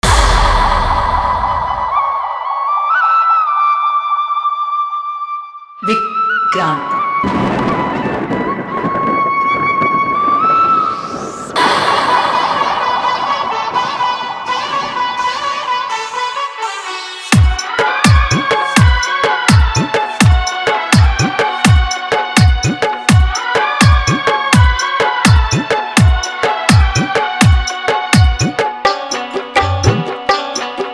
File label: Hindi Song Remix